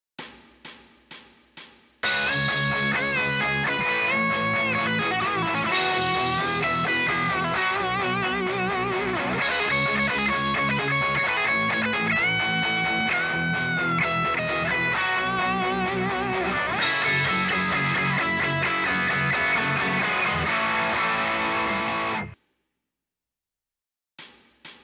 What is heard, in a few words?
solo 130